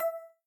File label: count up end; robin hood